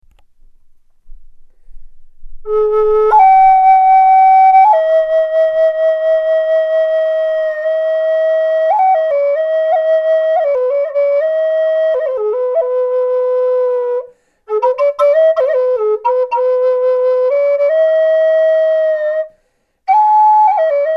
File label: Mid A432 Sassafras Feather, flutes